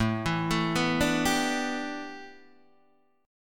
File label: A 9th Suspended 4th Guitar Open D, chord